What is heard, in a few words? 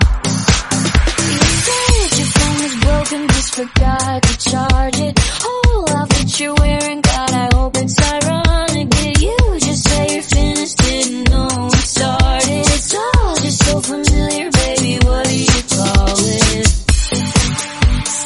128 bpm Pop Club Mix